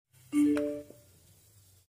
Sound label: Bluetooth Sounds; وصل شدن بلوتوث